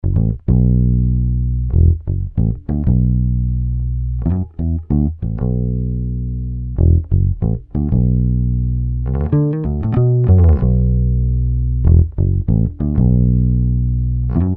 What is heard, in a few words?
studio oldschool, guitar; Guitar Rig 4 Pro